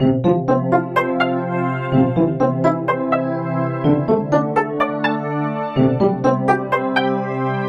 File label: custom inst; yamaha psr-e373